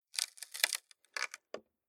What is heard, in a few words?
Splitting Wooden Crate With Hammer Crowbar Tearing Splintering Light